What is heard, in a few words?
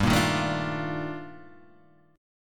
Eb Major Sharp 11th G